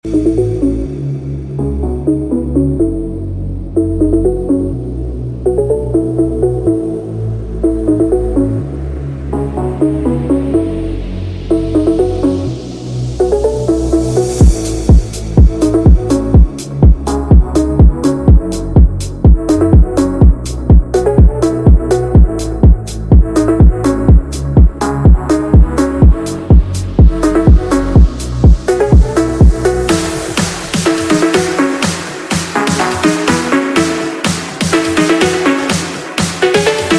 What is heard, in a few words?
Phonk; ringtone funk; آهنگ زنگ فانک